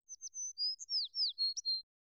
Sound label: Bird, ambience